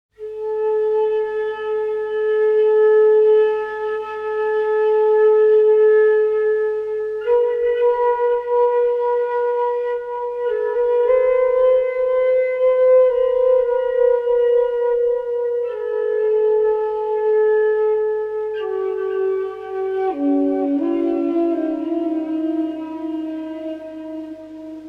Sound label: Flute Music